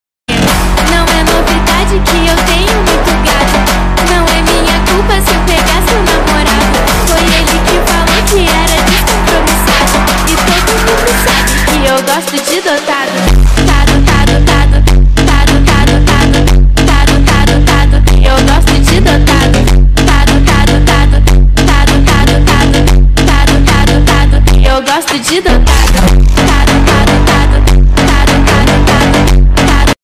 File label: phonk ringtone, phonk music; phonk br